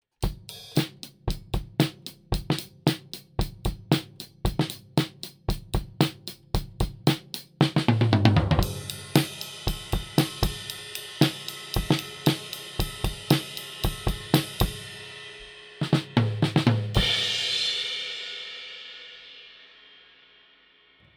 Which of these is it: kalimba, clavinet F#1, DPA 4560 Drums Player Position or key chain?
DPA 4560 Drums Player Position